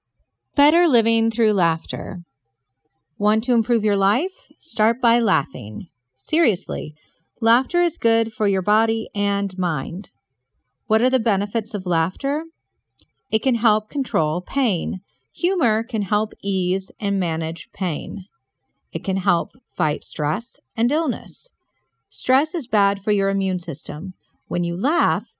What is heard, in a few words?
Laughter